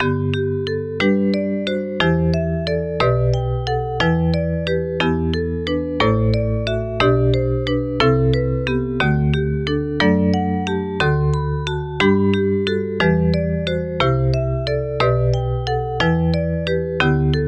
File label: vibraphone